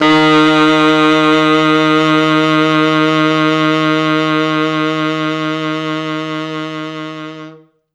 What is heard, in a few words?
T Sax D#2